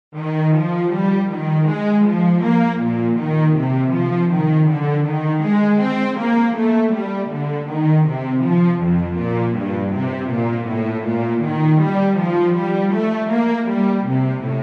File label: instrumental; Handel; Messiah